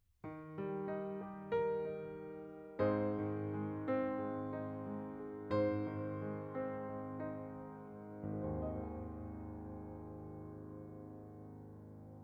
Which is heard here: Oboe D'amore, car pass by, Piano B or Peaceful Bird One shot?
Piano B